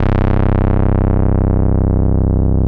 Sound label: MG TAURUS